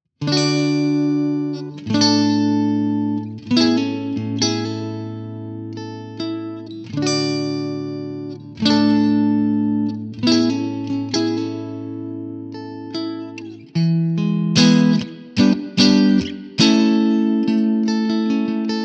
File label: clean; jensentone